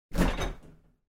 otkr dveri holod s butylkami; Включение, шум работающего холодильника и выключение

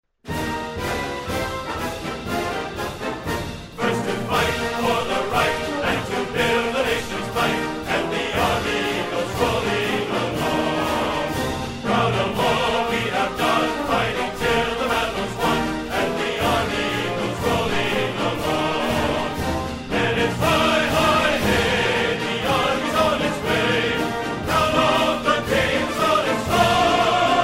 armeiskaya stroevaya pesnya

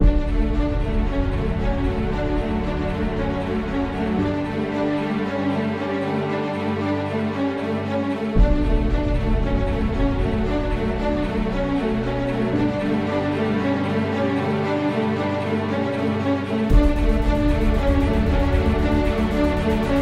muzyka dlya instagram

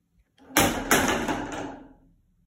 padenie plastik stula

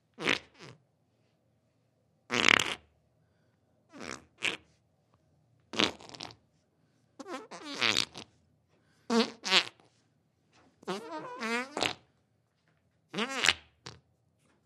puk cheloveka; Издавание человеком неприличных, но естественных звуков портящих воздух

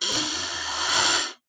murav, zvuk muravjeda

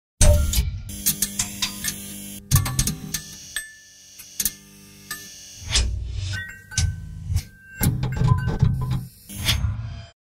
mercanie lamp

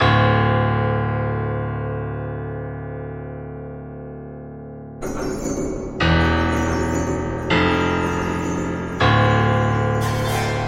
pugaushie zvuki v muzyke

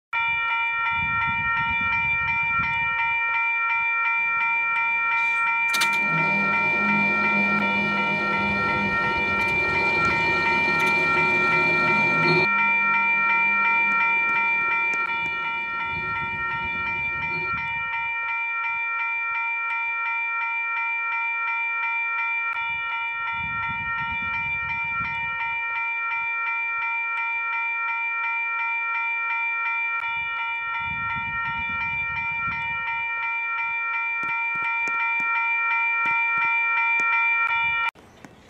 jd pereezd zvonok i shlagb

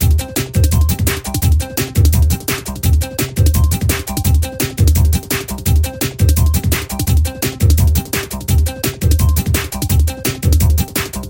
muzyka dlya igr

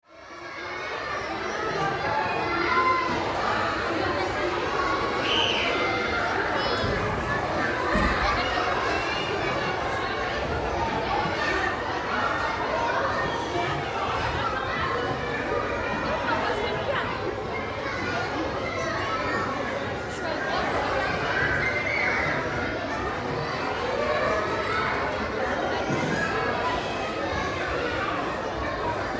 shum detei, zvuki igraushih detej

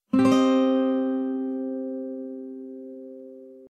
brenchanie gitary

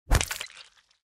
jmyak i krov

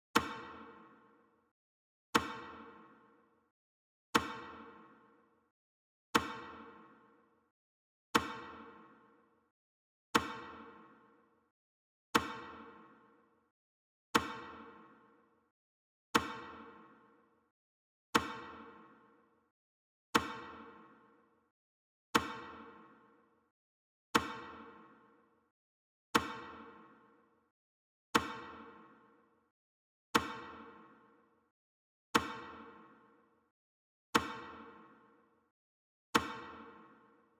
minuta molchaniia metronom

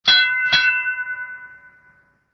Korabelnyi kolokol

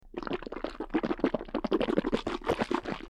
Poloskanie gorla

